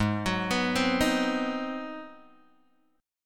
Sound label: G sharp 7th Sharp 9th, Guitar, Open G